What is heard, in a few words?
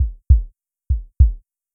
Heartbeats; Feel; NiceVibrations; HapticClipsDemo